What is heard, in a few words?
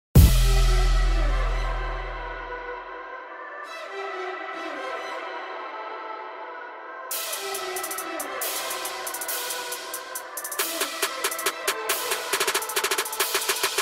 Infinix Ringtone; Infinix Smart 3 Plus